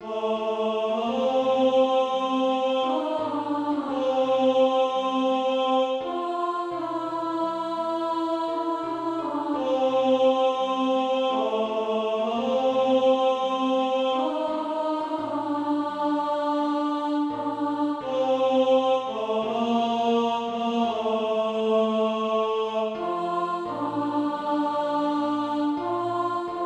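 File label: alto; SATB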